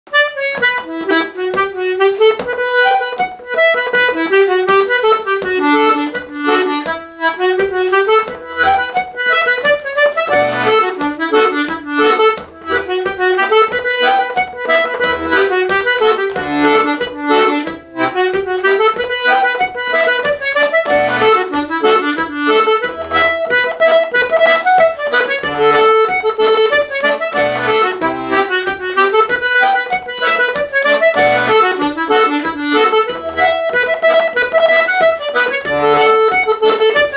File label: Button Accordion; GoldenKeyboard